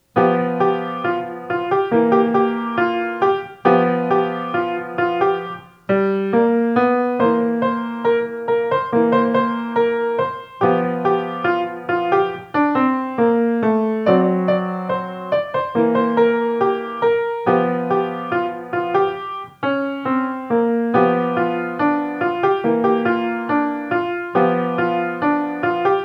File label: Blues; Progressive Jazz Etudes for Piano Book 1